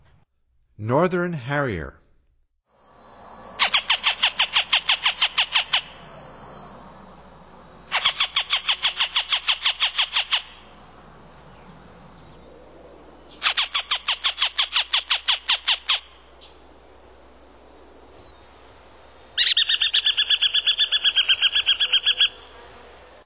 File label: Northern Harrier, Songs of Birds of North America; Stokes